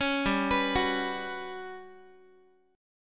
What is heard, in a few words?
G Major 7th Flat 5th, Guitar; GM7b5; arpeggiated